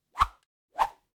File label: whistling whip